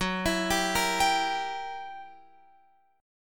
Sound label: F sharp 7th Flat 9th Guitar Open F, chord; arpeggiated; F#7b9; x,13,16,14,13,14